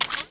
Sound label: draw, weapons